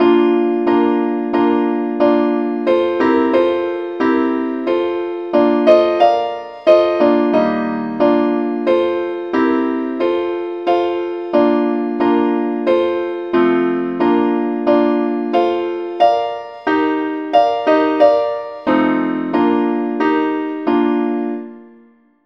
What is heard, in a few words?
simple acc key bes